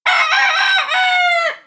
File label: chicken crow